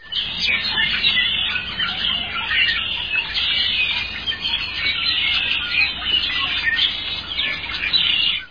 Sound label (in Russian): gromkij neprerivnij krik stai chernih drozdov